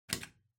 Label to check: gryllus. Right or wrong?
Wrong